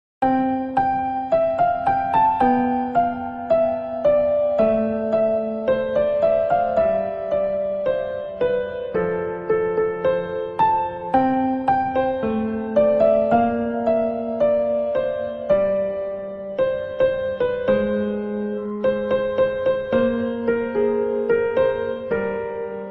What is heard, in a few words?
piano ringtone; android; dragon ball gt